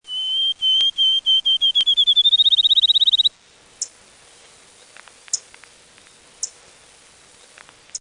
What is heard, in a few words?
Sparrow Field